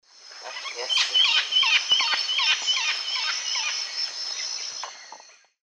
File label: Deroptyus accipitrinus, flight calls; S. America; Eastern Lowlands